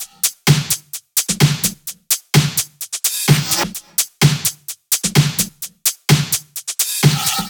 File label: 128BPM Samba Kit; VFH1 128BPM E Samba Kit